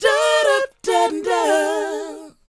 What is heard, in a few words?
DOWOP A#, Vocal R&B DOO WOP; ILIO; Vocal Planet